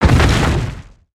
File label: Footstep; creatures organic